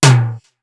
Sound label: Simmons SDS2000; SDS2000 Factory6